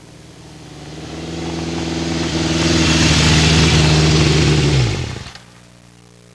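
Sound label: MOTORCYCLE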